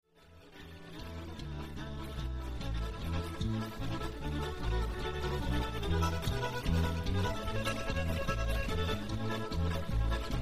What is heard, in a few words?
Instrumental, American Reel